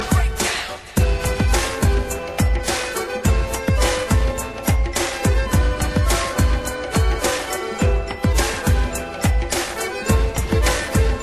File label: New Tone